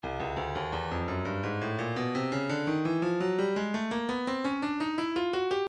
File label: breakbeat 085 bpm perc; Sultrifier Perc; Stylus Breakbeat Midi Files